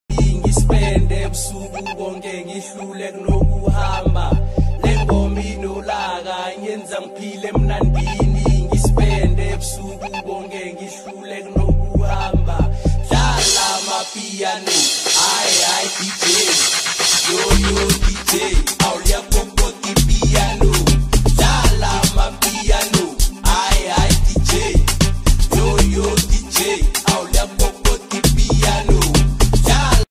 amapiano

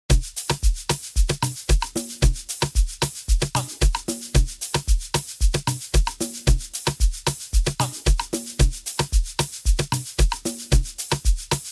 amapiano mix

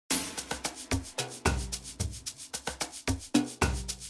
Amapiano

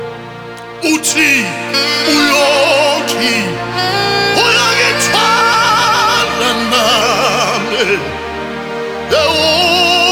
Hymns on Strings

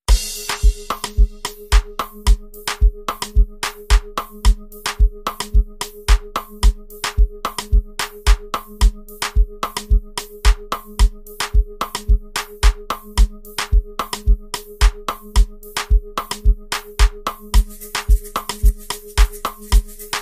AmaPiano Remix

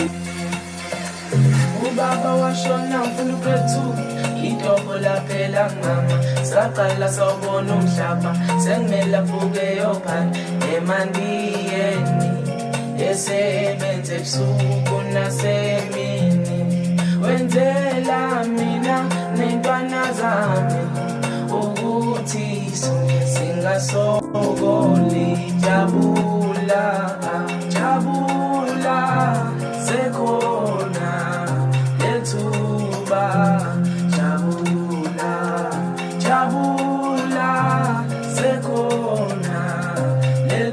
amapiano Song

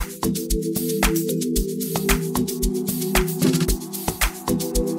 Amapiano Live Balcony Mix

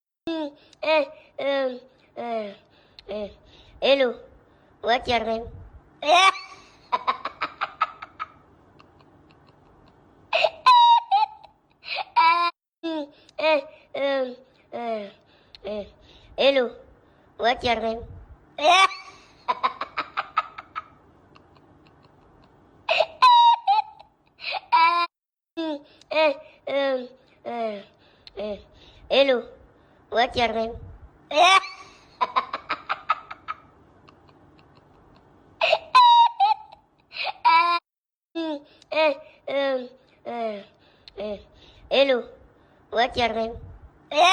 Laugh

